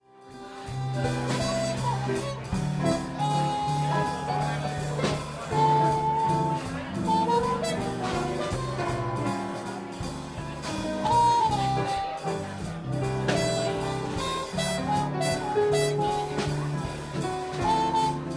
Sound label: Jazz Band